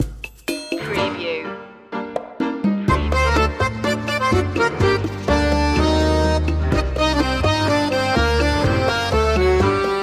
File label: drama tango